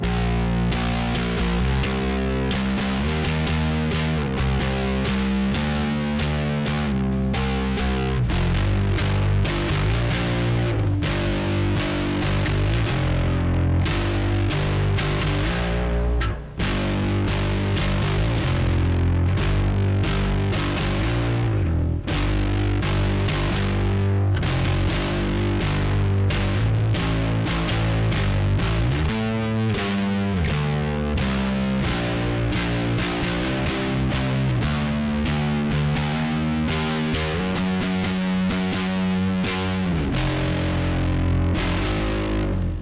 Layer Bass; The Binding of Isaac Repentance; Genesis II Reverse Mausoleum Layer (Bass)

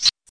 spooky

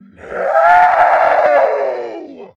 knockout

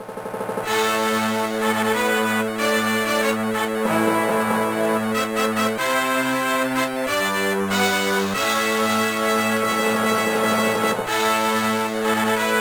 BGM; K-1 Pocket Grand Prix 2; GBA

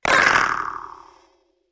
dw bumps

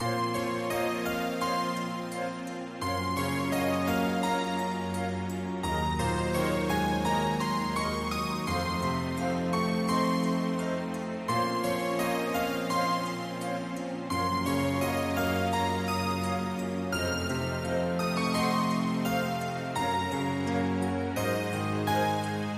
Stage fail; Certain Magical and Scientific Mystery Inventory; Pazdex; puzzle RPG; Android

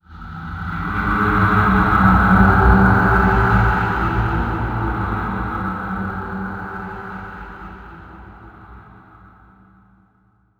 environment graveyard stinger winds short

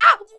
Blue Hit

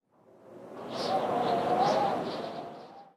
Amb Winter Windgust; Angry Birds POP Blast

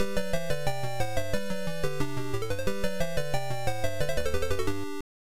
Start Music; antarctic adventure; msx

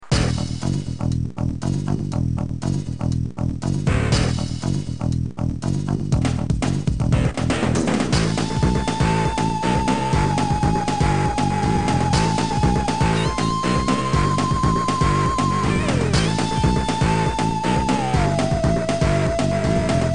metal; Urbz; GBA rip

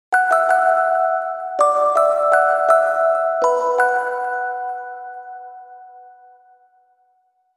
Music Box; Grimoire Song; Rune Factory Frontier

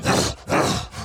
Enraged Breathing Loop; The Town

